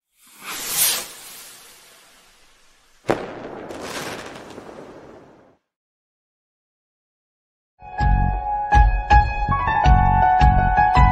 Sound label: telugu love bgm instrumental bgm; merupu kalalu